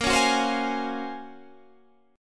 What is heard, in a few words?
Gb Major Sharp 11th Bb, guitar